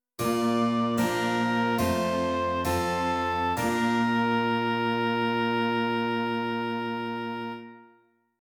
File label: continuo, dictation